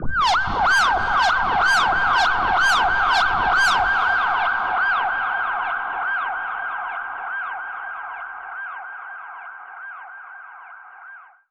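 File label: Modulation Processed, alarm siren samples 125bpm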